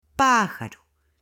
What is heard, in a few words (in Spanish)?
PAJARO